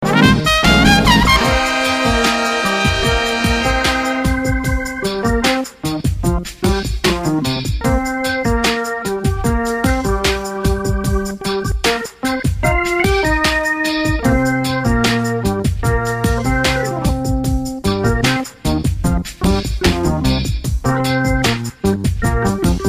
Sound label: Funk; B-3